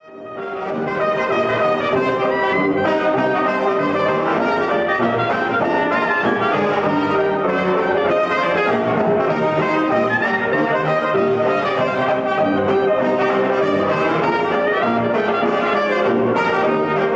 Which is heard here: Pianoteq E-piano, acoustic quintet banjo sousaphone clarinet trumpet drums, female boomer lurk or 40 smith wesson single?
acoustic quintet banjo sousaphone clarinet trumpet drums